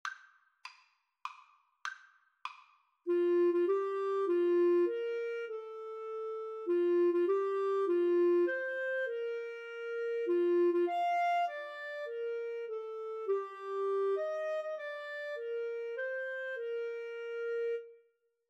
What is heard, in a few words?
happy birthday, Clarinet-Saxophone Duet